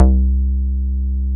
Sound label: BASS MONO